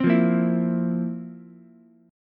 F Major 7th Suspended 4th F, Ukulele; FM7sus4 strummed; JGuitar

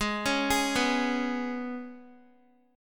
G sharp Minor Double Flat 5th, Guitar; arpeggiated; x,11,13,13,11,x; 4F,4A,5C,5G,5C,6E; G#mbb5

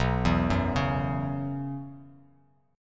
B Suspended 2nd Suspended 4th; arpeggiated; JGuitar

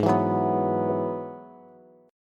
Ab Minor 7th Sharp 5th Ab, down, Bass; strummed; JGuitar

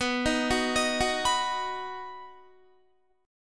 B Major, Guitar, 4F,4A,5C,5G,5C,6E; arpeggiated

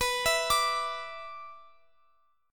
B-Minor Double Flat 5th, Guitar Open F; Bmbb5; arpeggiated; x,x,x,18,19,21

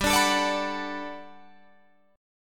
G Major 9th, G, down, Guitar, Drop D

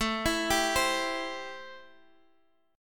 A-Minor 7th A; x,x,7,9,8,8; arpeggiated; Am7

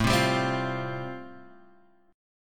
A-Minor 7th Sharp 5th A; x,x,x,2,0,2,2,2; Am7#5 strummed; JGuitar

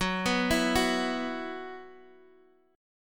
E Suspended 2nd Gb

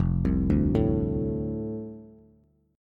F 6th F down Bass; F6 arpeggiated

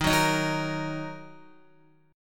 Eb Minor Eb Guitar 4F 4A 5C 5G 5C 6E; Ebm strummed; JGuitar

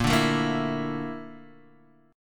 A sharp Major 7th Flat 5th A sharp Guitar Open D

